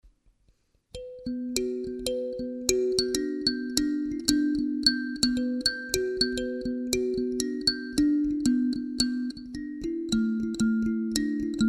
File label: B minor pentatonic impro, Kalimba